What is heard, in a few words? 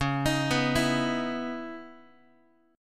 Db Minor 9th Db down Guitar; arpeggiated; x,9,6,9,8,x; 4A,4E,5A,5D,5G#,6C#